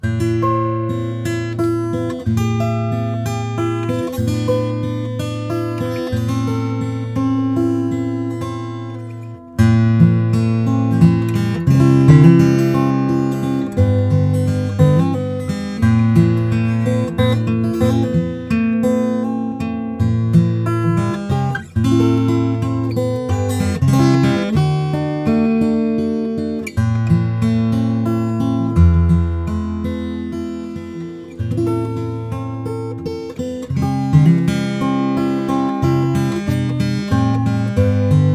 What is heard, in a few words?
Picking, guitars